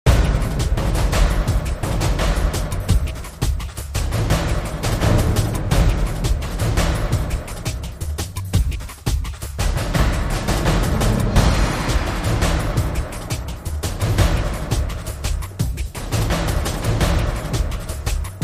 Cinematic Drums

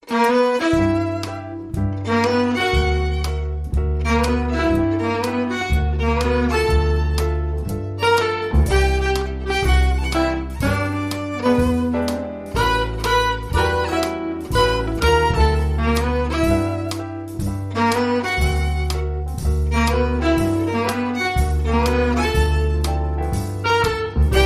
Jazzy Glide

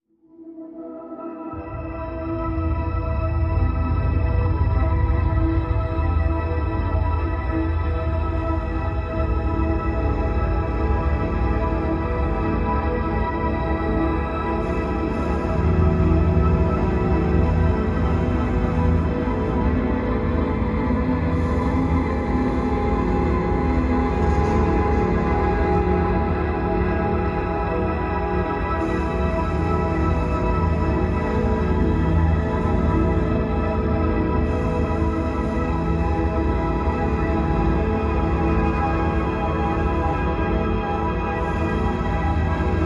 Bed Mix

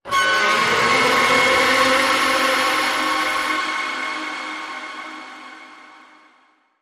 Fear Creature Scream

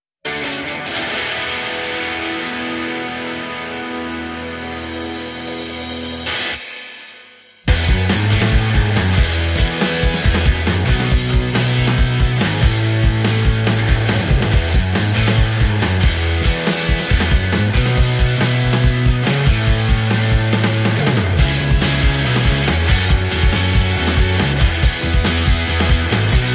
No Lead Guitar Mix

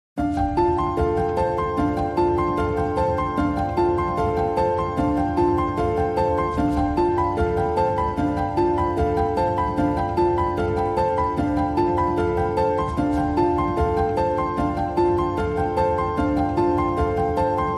Piano Only

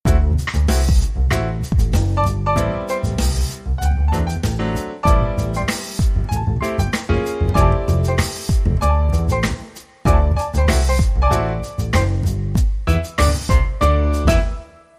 Acoustic Funk

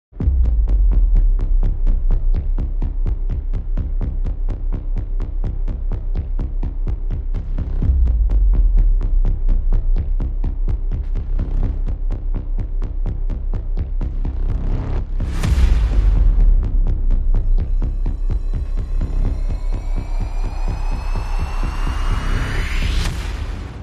Bass Synths and SFX Only

